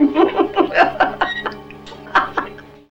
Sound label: laugh evil; ooh ahh